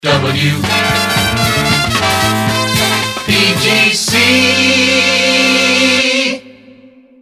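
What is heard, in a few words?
jingles resings with horns; pams series 42a; pgc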